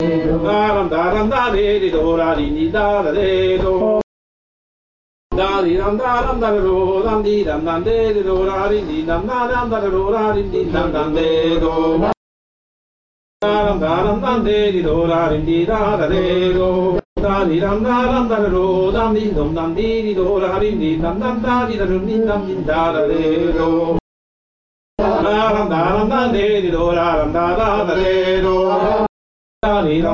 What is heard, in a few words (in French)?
Gavotte Chant; Gavotte Pourlet